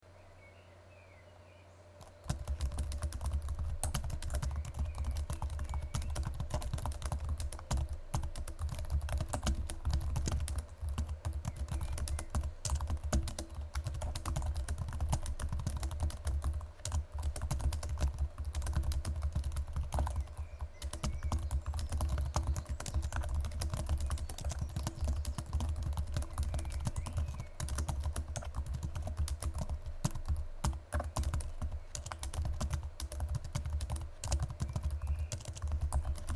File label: laptop keyboard